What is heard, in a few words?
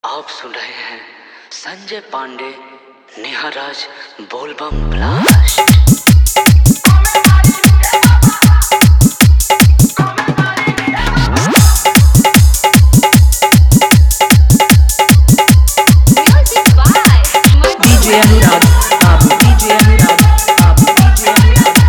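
Dhollki Bass Dance Mix, Bhojpuri; Bolbam Song 2025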